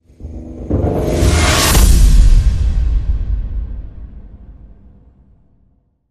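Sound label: Hit Horror Scared Stiff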